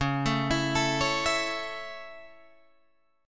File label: Db Minor Sharp 5th Db Guitar Open G